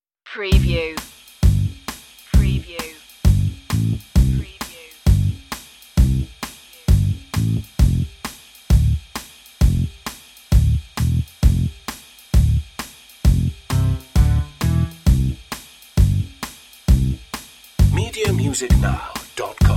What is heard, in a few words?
underscore